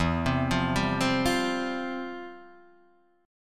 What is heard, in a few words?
C Suspended 4th E; Csus4/E arpeggiated; JGuitar